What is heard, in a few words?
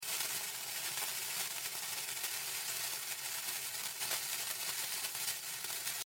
Fuse Sound